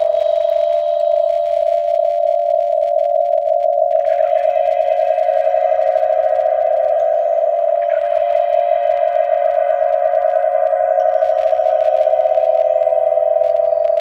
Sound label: Alien Artifact Loop